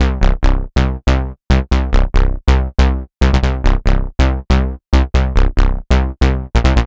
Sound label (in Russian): бас сэмплы техно транс; Sawyer